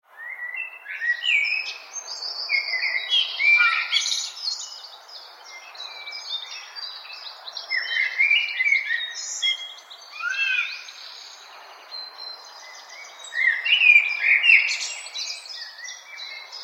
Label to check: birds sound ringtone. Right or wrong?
Right